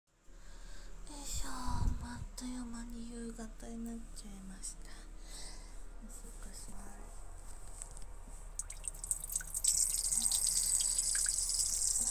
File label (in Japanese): 夕方のお家のトイレでオシッコ！; ３日目; 実演音声